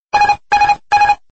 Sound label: airbus a320 AP disconnect